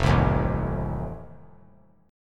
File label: F 7th F down; F7 strummed